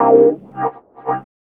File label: Chords Tones; Equipped Music; Loungin House